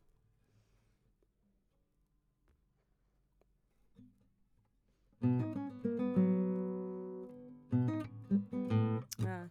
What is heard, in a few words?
GTR